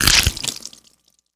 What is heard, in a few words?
bite hit; The Monster Plane; kolmods melee